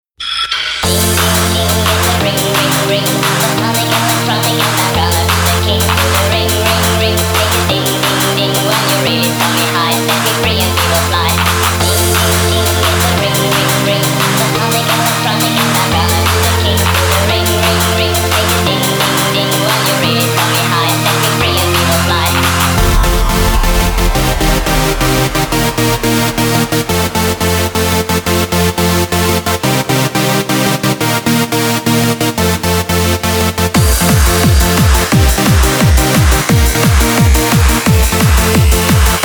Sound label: Ding da Ting Sonic Electronic